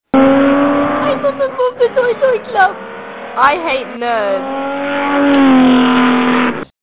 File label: Kids Car